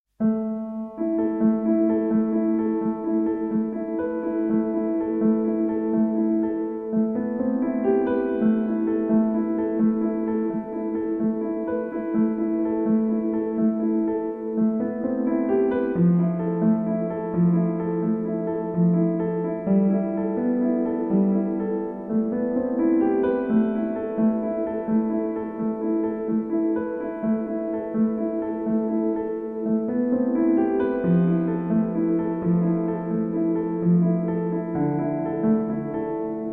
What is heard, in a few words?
Piano